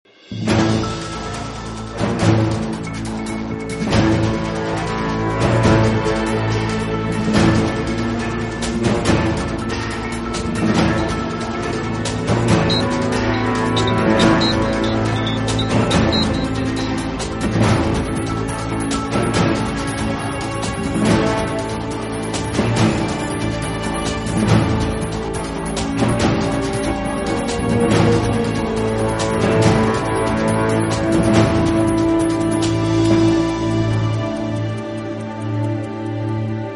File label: action ascending